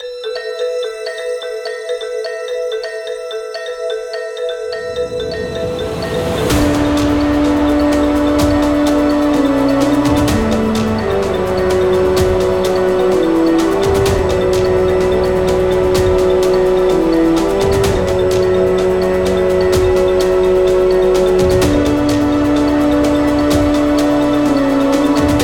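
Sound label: background music dungeon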